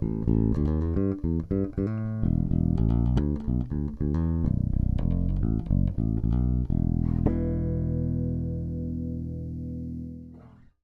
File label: Lollar Thunderbird Active Bridge